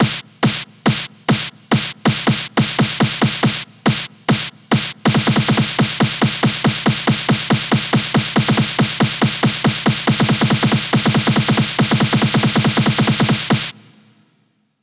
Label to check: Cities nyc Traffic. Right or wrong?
Wrong